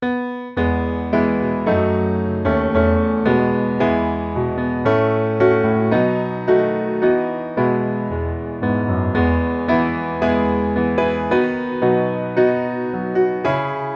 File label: piano